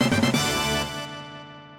Jingle

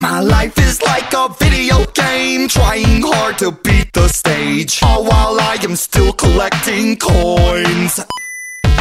Game Over

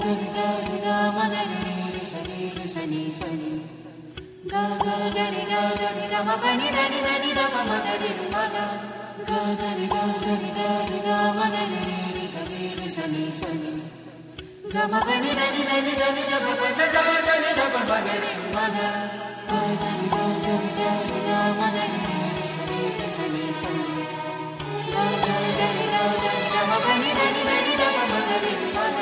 Soft Indian Clasical

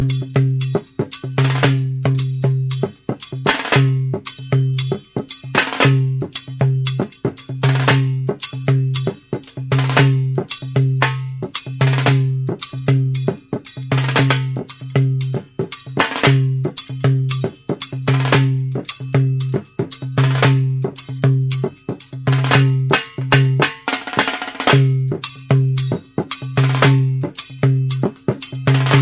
Kashmiri Dhol

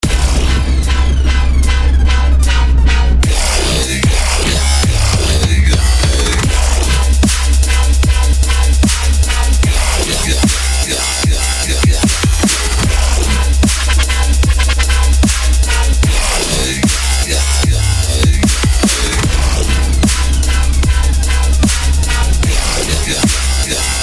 Glitch

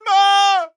Yelp; Recruit

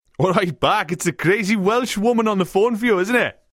Welsh Ringtone

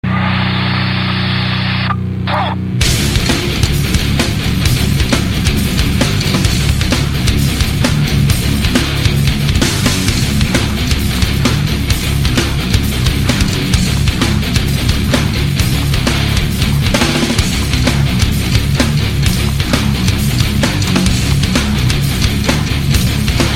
Rock

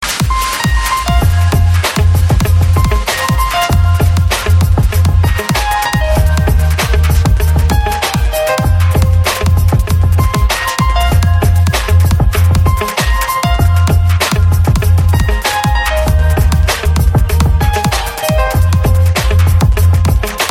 Nokia Dj Mix; Nokia C5

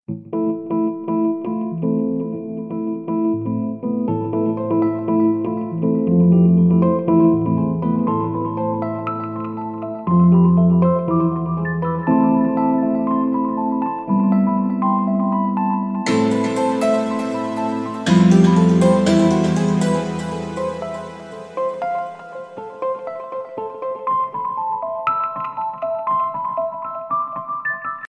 Thoughtful Tone

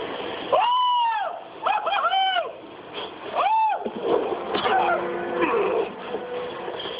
Scream; Eureka

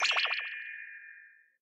Cartoon magic

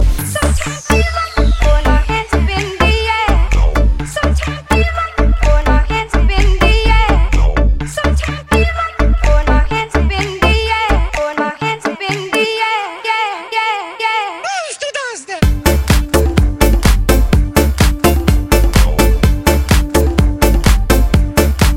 Best Ringtone

